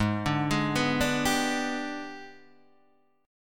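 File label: Ab Minor Major 7th Flat 5th chord, Guitar, Open D tuning; 6,5,6,5,5,5; down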